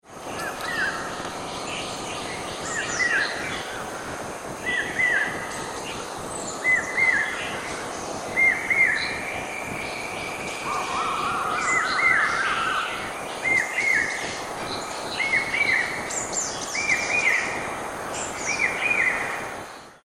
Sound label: Common Hawk Cuckoo, Udahamulla; Sri Lanka